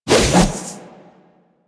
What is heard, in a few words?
Dodge Whoosh; Warframe; Wraith